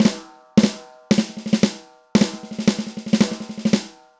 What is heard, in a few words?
Marching Snare